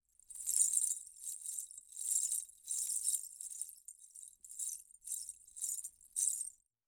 Hawaiin Skirt Beads Jingle; Universal SFX

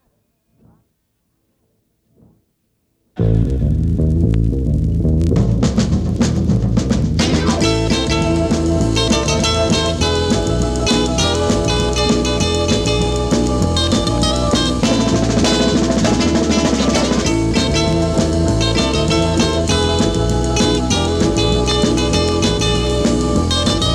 Neat Beats

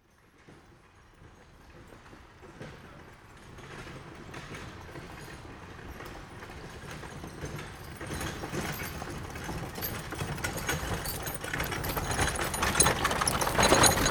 Wagons; Electa Quinney

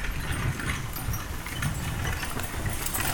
Horse Drawn Wagon By; Electa Quinney

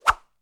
Whoosh, Airy

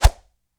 Whoosh, Arrow, Feather, Thump; Universal SFX

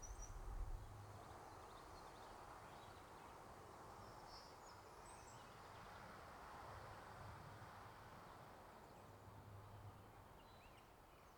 Pines and Birds; Electa Quinney

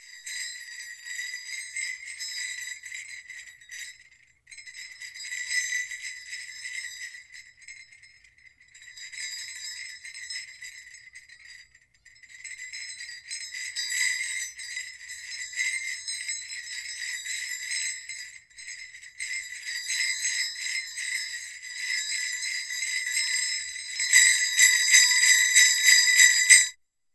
Bells, Sleigh, Slow, Erratic, Hard; Universal SFX

